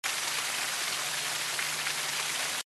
Water Loop

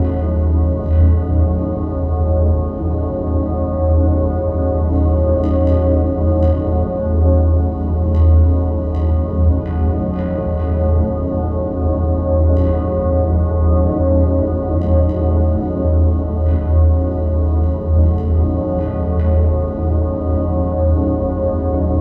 Ambient; Dishonored 2

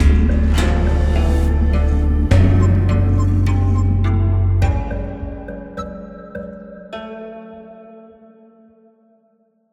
intro stinger; biomutant; gizmo

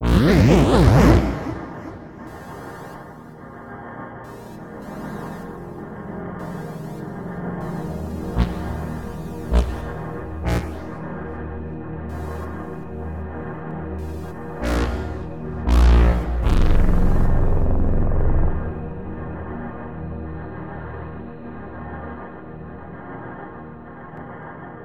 Synth Tower Deactivate; gamerip; door to door

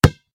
Rock Impact Ballon

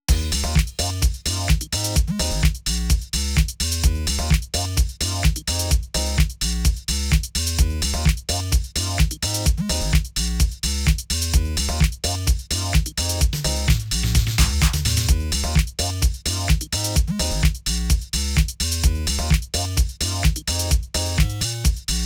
old disco; discord; Illmatic Envelope Swamp

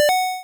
Upgrade Cabinet Buy; Redmatch 2

